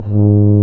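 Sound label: brass, C Tuba; Tubas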